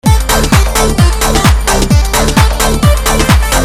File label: Loop